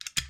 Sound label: spin Button Click; netent